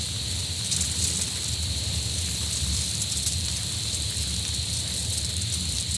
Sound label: Ambience sandfall; adams venture origins